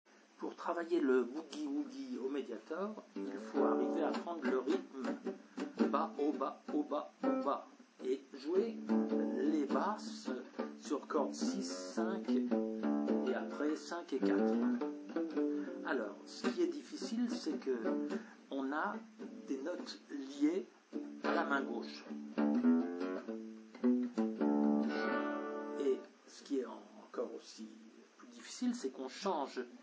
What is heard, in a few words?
boogie, guitare